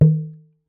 Talking Drum; Percussion Samples

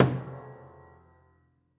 Body Thump Sgl, Piano Destruction

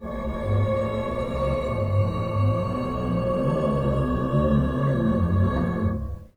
String Rub, piano; Piano Miscellaneous

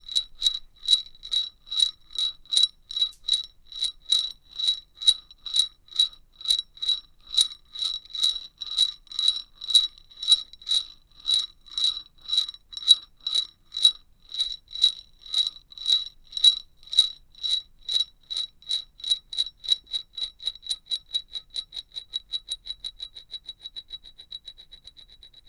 Marble On Base, Large Wine Glass

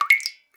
Drips

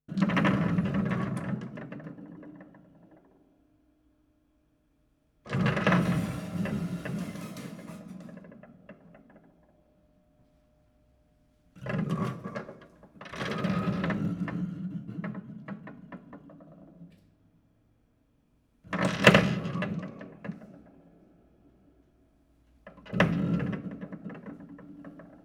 Marble Rattle, Piano Destruction

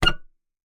Melodica Short Note

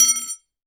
Aluminium Tube Drop

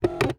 Single Shunt, DVD Drive External

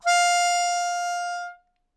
Melodica Long, Long Notes Chromatic

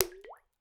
Plip

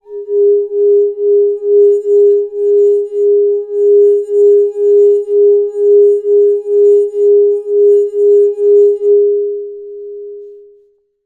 Finger Rub, Large Wine Glass

